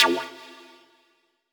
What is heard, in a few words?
Lead C3; Zero-G Club Techno